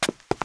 preslap; Mafie 1

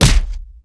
body; Mafie 1

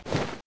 noise; Mafie 1

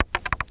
wood; Mafie 1

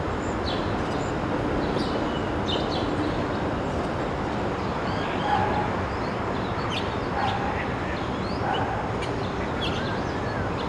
town; Mafie 1

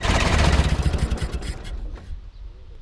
ford A off; Mafie 1

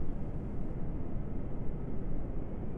ambi; Mafie 1

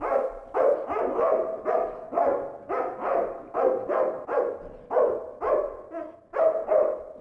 dog in; Mafie 1

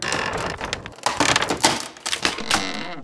creak; Mafie 1

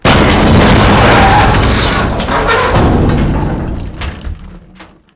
vagon; Mafie 1

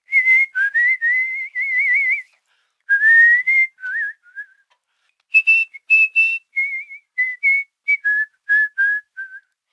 song; Mafie 1

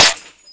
glass; Mafie 1

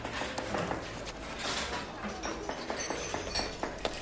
kitch; Mafie 1

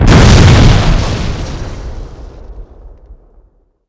explo; Mafie 1

